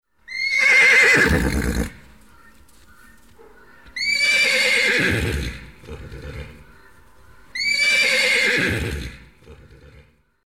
klacz i zrebie; karty obrazkowe; z kurnika do koszyka

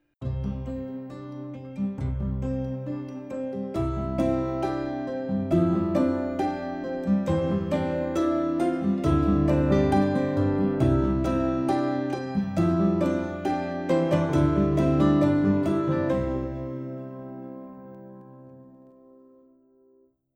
plas bez wokalu; szesciolatki; multiprzewodnik; akomp

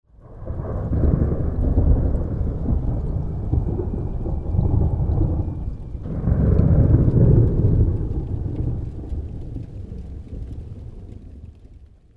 grzmot; kalendarz pogody

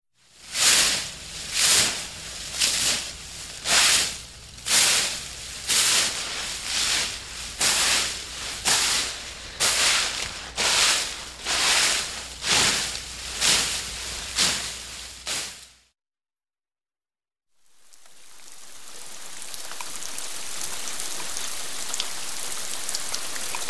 jesienne odglosy; szesciolatki; karty aktywnosci

